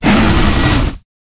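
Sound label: Dragon Skeleton block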